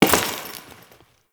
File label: Follie Enemy Step; Warframe